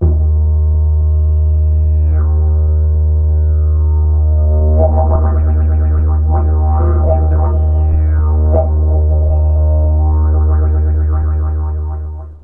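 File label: didgeridoo; Notes; Winds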